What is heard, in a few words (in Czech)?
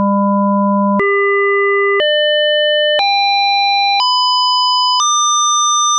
200 az 1200 Hz; Cerne klavesy